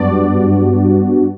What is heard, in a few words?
Synth Shots; Vengeance Samples